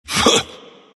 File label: grunt; Dota 2; ember spirit